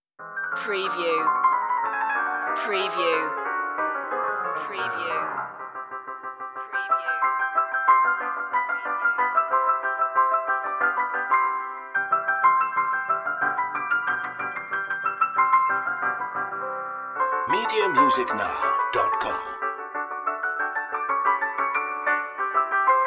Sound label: piano scherzando retro lo-fi